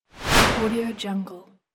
woosh

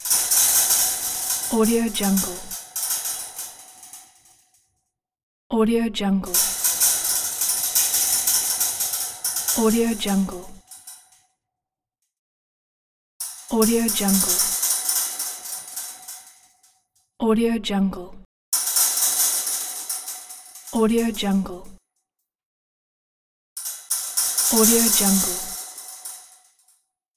steel welded wire mesh fence long

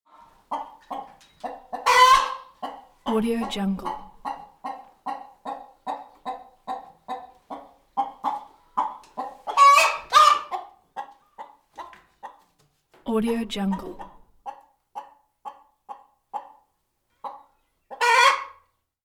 chickens classic chicken clucks cackle bagaw

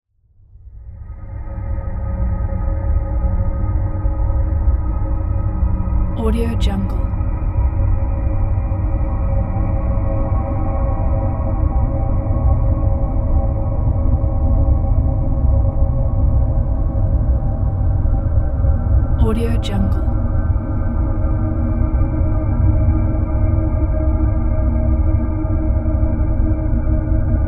deep space ambient

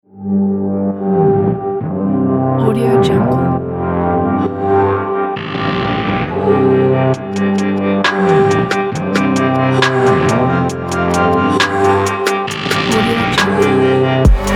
freedom atmosphere

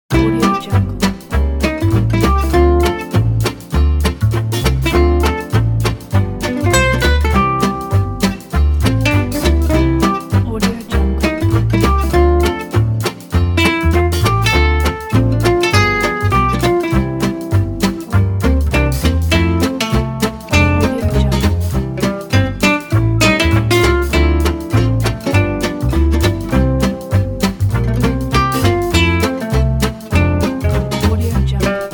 happy jazz

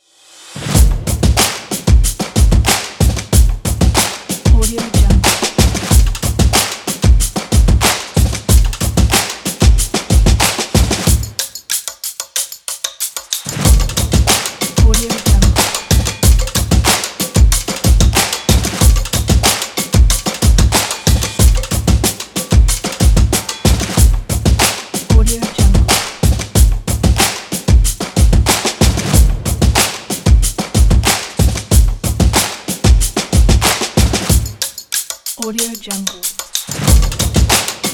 sport drums

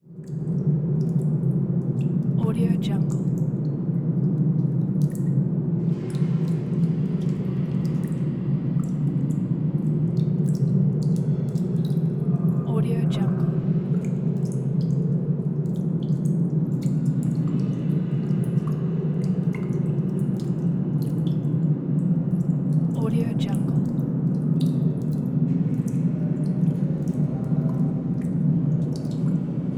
dungeon

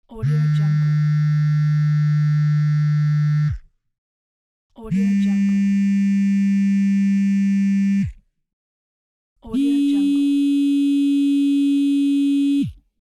phone vibration

